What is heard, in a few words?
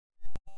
mic, mars2020 edlcam